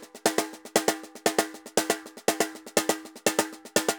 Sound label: Tambor Samba 120 bpm; Best Service; Festa Latina VOL-1